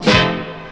BAND SHOT; BEAT2000

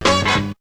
shot; BEAT2000

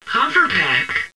HOVER; THEXDER

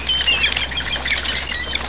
SEASHORE, NATURE

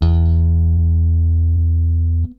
BASS, ROCK POP; MAGIX

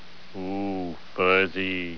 FUZZY

